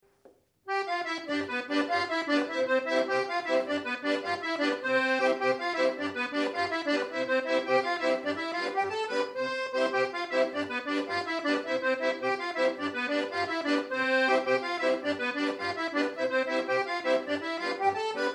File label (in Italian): Saltarella